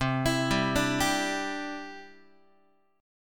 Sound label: C Major Add 9th, Guitar; arpeggiated; JGuitar